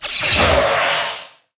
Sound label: Psy Chop; Gamigo